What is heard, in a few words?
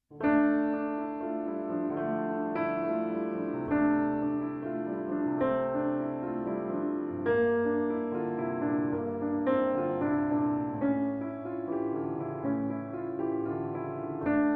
Solo Piano